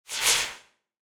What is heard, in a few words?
spell whoosh; soundscrate